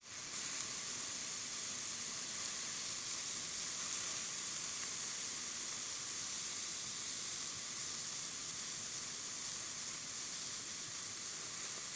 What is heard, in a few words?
small grill; sonniss gdc